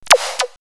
Burning Chip, synthfx; X-Static Goldmine

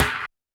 TD-20 Snare; Snares

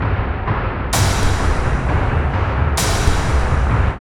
LOOP, Tekno Industrial; East West

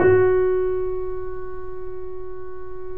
F#, The Piano

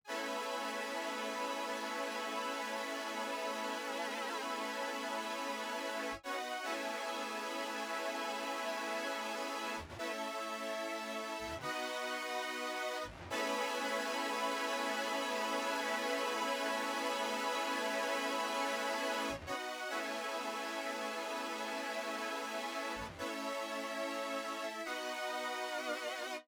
synthpad, Smooth RnB, F#min

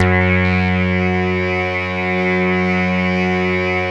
CS-15, BASSES

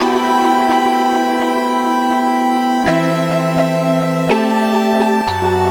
Ghost March, Synth Sequences

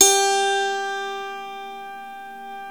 SWEDISH; World Combo

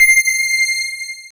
SYN BELL, SYN Analog; Sound & Vision - Gigapack I CD 2 (Roland)

